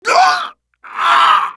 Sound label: death; mohaa; dialogue